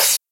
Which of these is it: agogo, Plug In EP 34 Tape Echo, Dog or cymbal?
cymbal